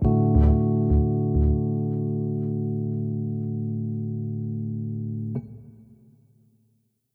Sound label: Rhodes Fade, Pads